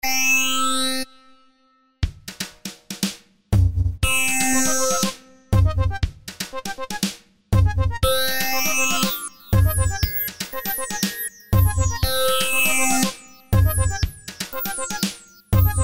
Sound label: synth; Katarakt records